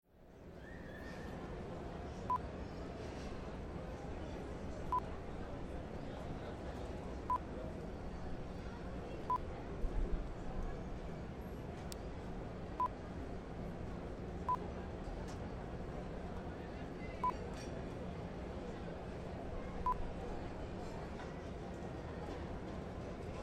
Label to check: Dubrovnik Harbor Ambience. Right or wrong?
Right